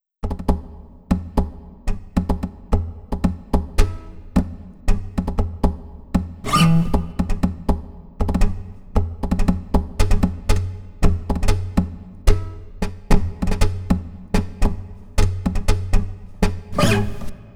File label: guitar fx